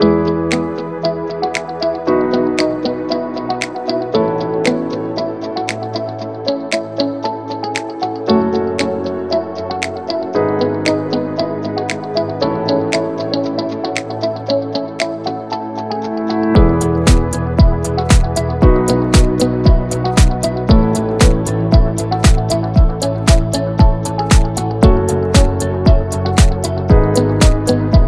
Positive Corporate Inspiration; educative

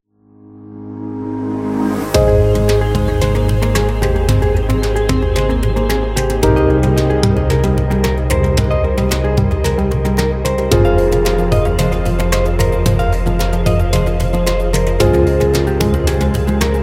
India Positive

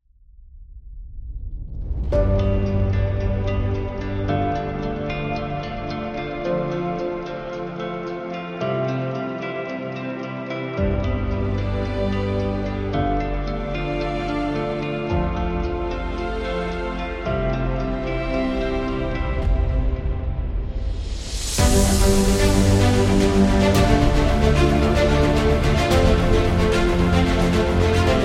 Cinematic Trailer

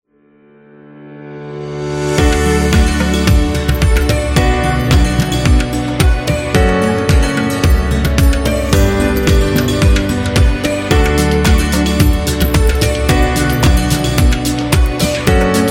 Inspirational India

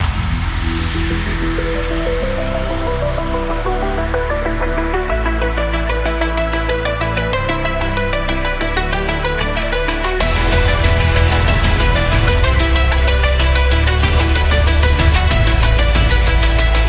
Synthwave

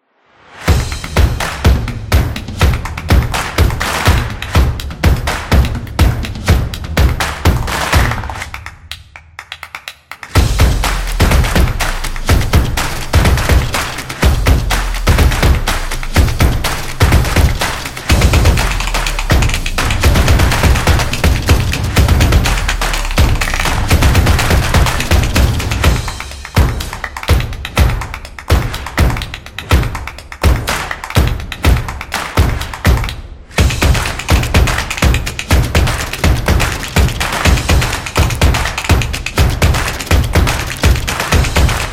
Drums Background; fast tempo

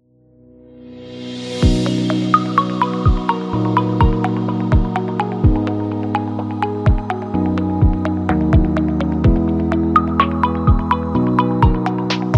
Warm

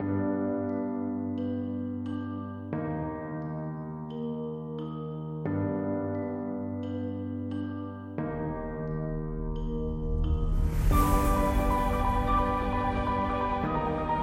Space Sci-Fi Cinematic; cinematic action

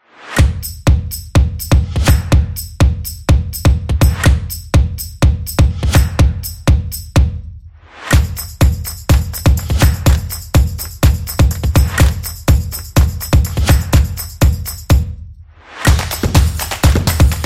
Vlog Opener

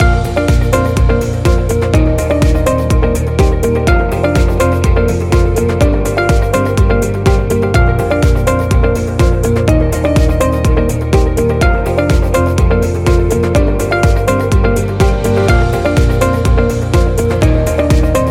The Corporate Loop Real Estate; hi tech

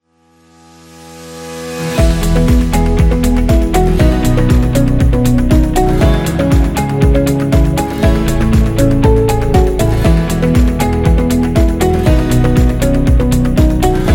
Corporate Beat

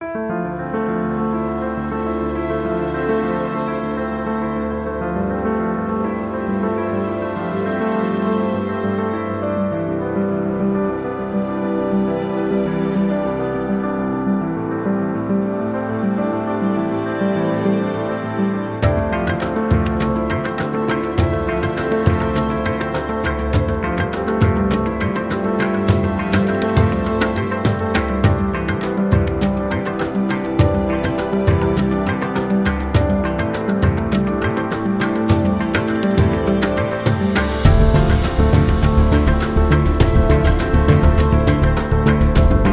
Indian Devotional

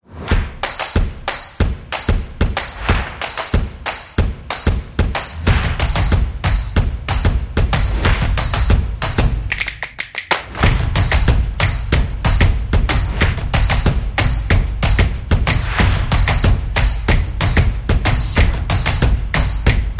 Upbeat Action Drums

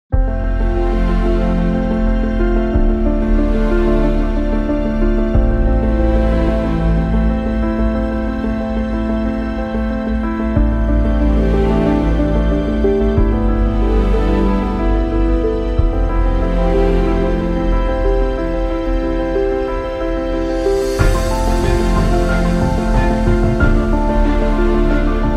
Documentary Soundscape Inspiration; cinematic action

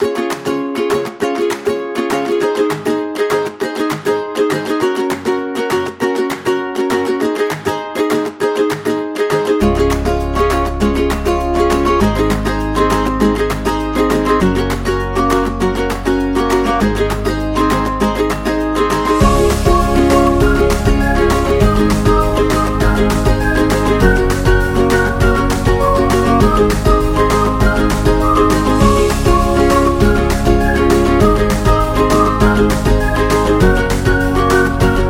Fun Loop, happy holiday

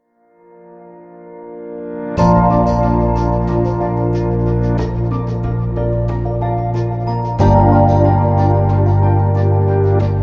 Chill

